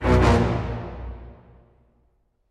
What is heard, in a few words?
low staccato; soundscrate